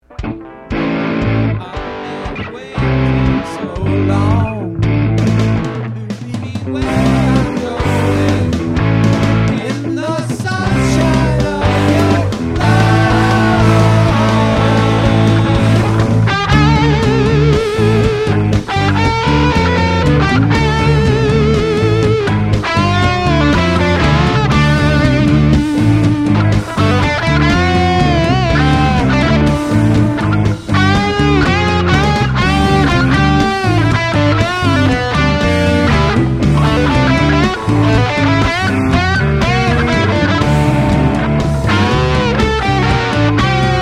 Strings

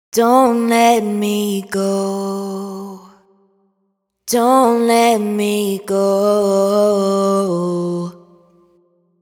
hook Dont Let Me Go 115 Fm wet, Vocal; Slate Digital Omnivox Vocal Sample Pack

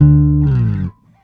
Bass Dive FX Octave D, Electric Bass One Shots; Slate Digital BASSHOLE Sample Pack

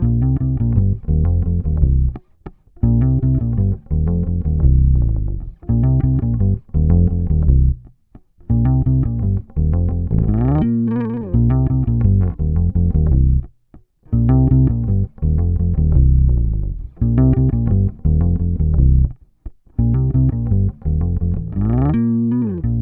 Bass loop 85 Cm, Electric Bass Loops Direct; Slate Digital BASSHOLE Sample Pack

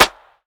clap chop, Hip-Hop; Drum One Shots; SD CAICOS Hip-Hop Sample Pack; Slate

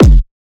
kick slam; Drum Sample Pack; One Shots; Slate; SEISMIC

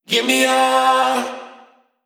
Vocal Sample Pack Phrases Cm; Slate Digital Omnivox Vocal Sample Pack

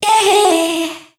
Omnivox oneshot F#m, Vocal; One Shots; Slate Digital Omnivox Vocal Sample Pack

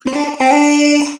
Vocal one shot D; Slate Digital Omnivox Vocal Sample Pack

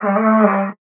vocal magnet Am; SD AURORA Sample Pack; Slate

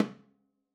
timbales High Mute; Raw Perc One Shots; Slate; SD SEISMIC Drum Sample Pack

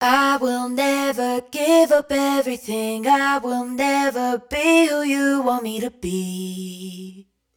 hook Never Give Up Everything 160 F#m wet, Vocal; Slate Digital Omnivox Vocal Sample Pack

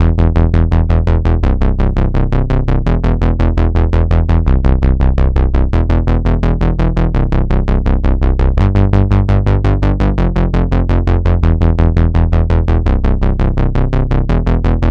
Synth loop 84 E, Synth Bass Loops; Slate Digital BASSHOLE Sample Pack

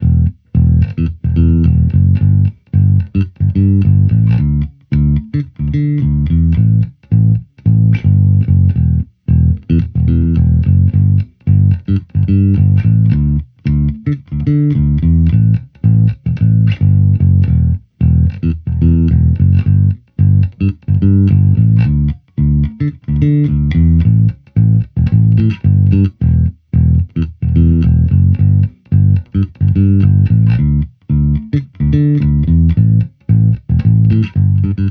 Amped loop 110 Em, Electric Bass Loops; Slate Digital BASSHOLE Sample Pack

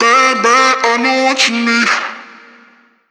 phrase Bb, Vocal

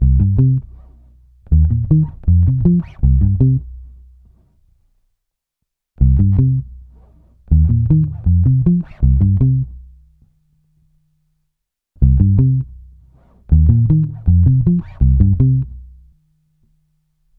Bass loop 160 Cm, Electric Bass Loops Direct; Slate Digital BASSHOLE Sample Pack

